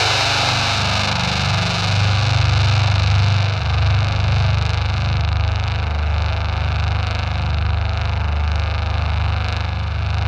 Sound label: ZWEEP, Synthy Tonal; Distored Reality